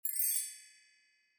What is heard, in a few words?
tone